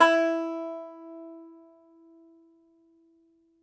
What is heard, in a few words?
Tenor Banjo, Plucked Strings